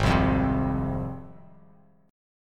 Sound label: G sharp 6th G sharp down, chord; strummed; JGuitar